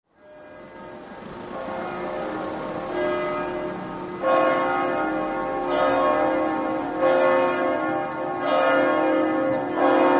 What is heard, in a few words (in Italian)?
campane, Tolmezzo